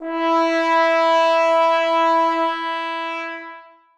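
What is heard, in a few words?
trombones sustain e4; Sonatina Symphonic Orchestra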